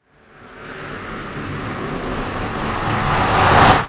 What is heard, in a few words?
trigger, portal; minecraft